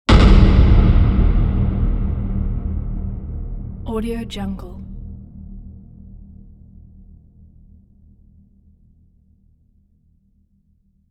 impact

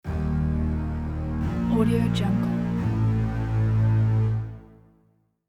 dark strings

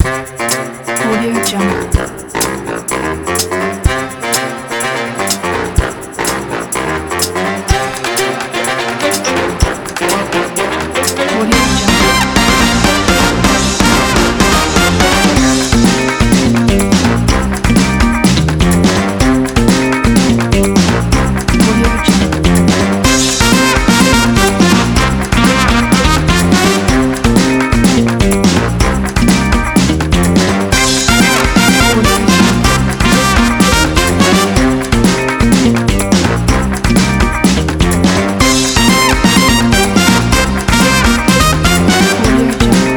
band rock groove retro

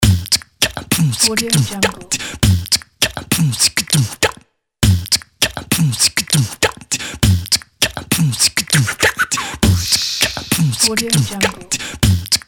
beatboxing

